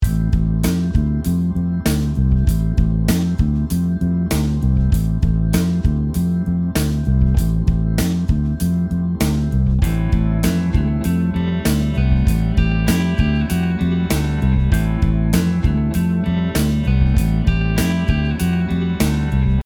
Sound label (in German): Rock Bass Pentatonik